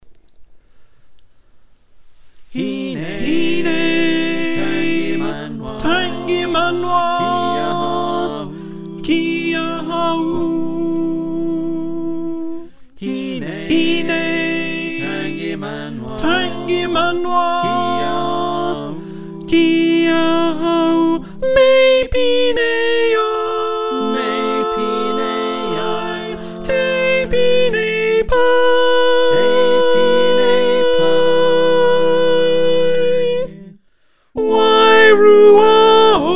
Soprano

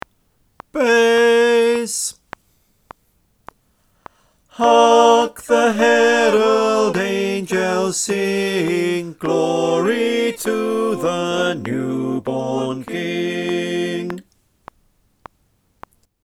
Bass refrain click

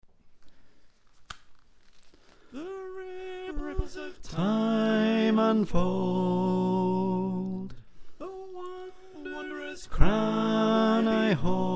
Tenor, choir

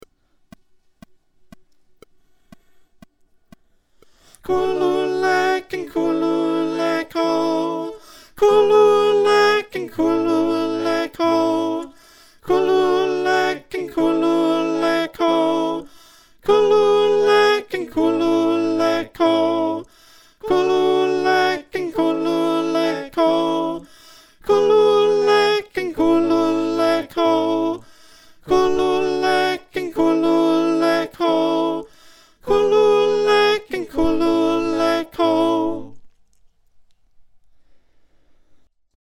Backing Chant Alt

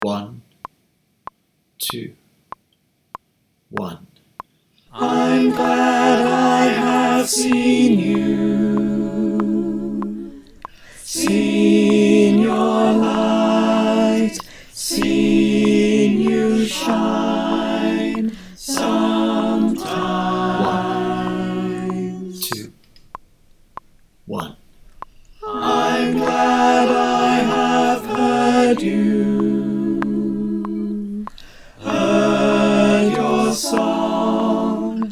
Tenor w click

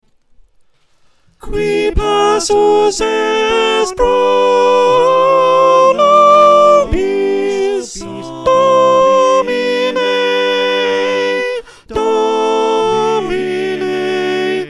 Adoramus Te Christe - sop

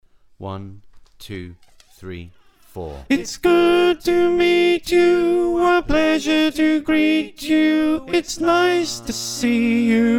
Alto, choir

